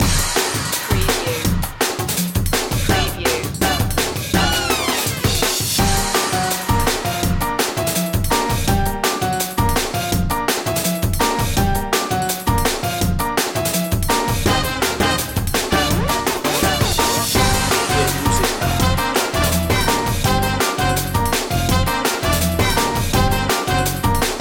latin light

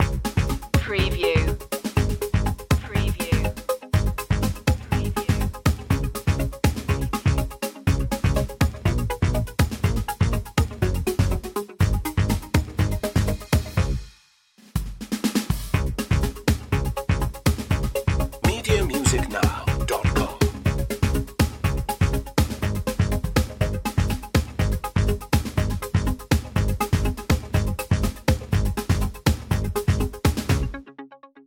bubbly loop

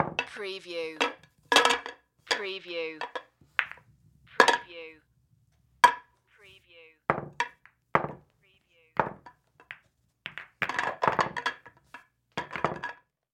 Billiards Rack Balls; sound-effects-bible

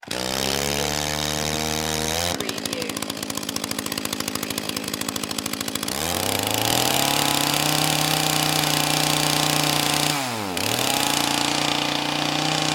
Chain Saw, Start, Run, Rev Long, Turn Off

